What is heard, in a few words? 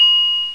Tinklebell (C5); X-Wing Demo; X-Wing Patches